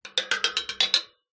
metal bars object rattle drag between; foley

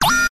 robot servo motor mechanical whirr fast movement; science fiction

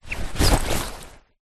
backpack small put on shoulder

